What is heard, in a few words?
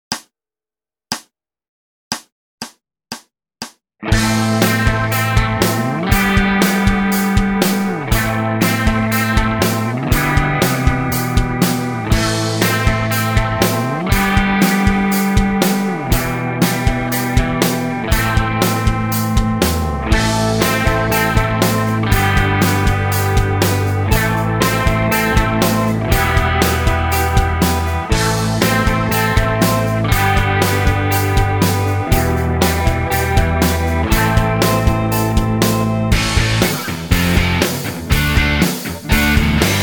Rock Backing, guitar; RGT